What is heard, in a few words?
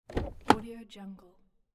car door open; urban sounds